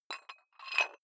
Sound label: dumbbell put on rattling big weight soft, fitnessstudio